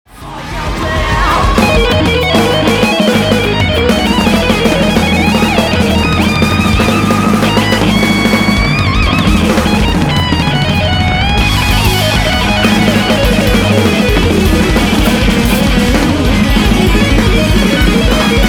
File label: Solo